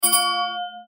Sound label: Chime Multimedia; Web Interactive; Chimes (Sound Effects:Multimedia); Blastwave; Technology